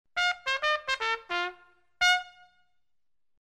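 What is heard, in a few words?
trumpet; iz eralasha truba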